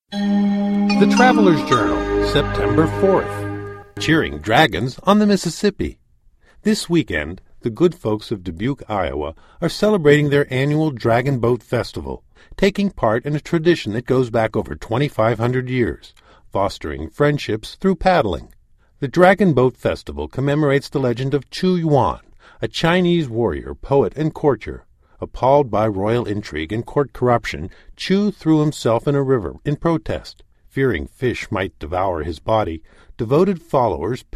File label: cheering, dragon boat festival; dragons on the mississippi